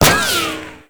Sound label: Shield Impact; Enemies; Gameplay; Lasrian Tank; Warframe